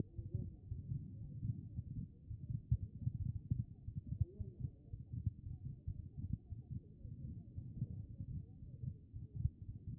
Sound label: HEART; B MODE; cardiac